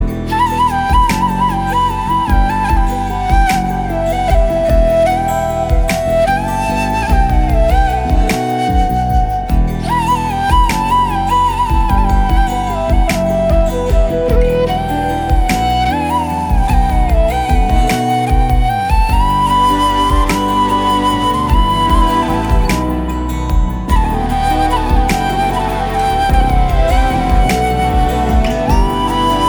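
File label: Flute background music Ringtone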